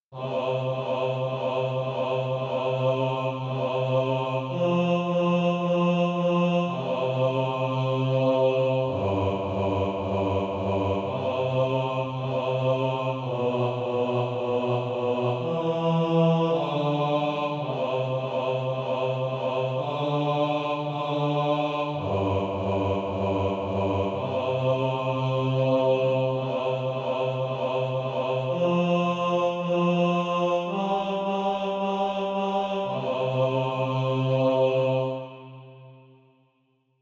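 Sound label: bass, Practise Music; Come with Happy Faces; SATB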